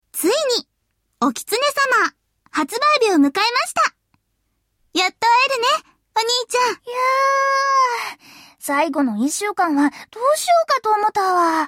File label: countdown